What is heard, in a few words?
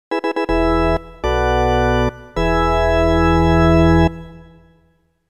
win